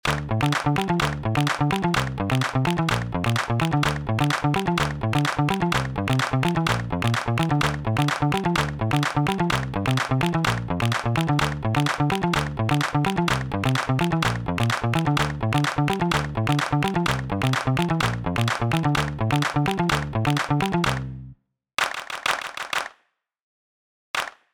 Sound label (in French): rythmes sans tom